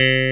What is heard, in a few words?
MID CLAV, swing synths; Zero-G - Funky Elements